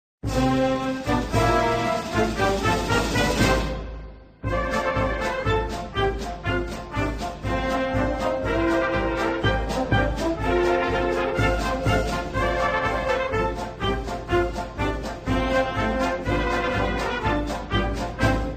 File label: US Army Ceremonial Band